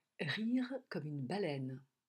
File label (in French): rire comme une baleine